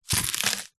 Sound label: spring onion single chop with knife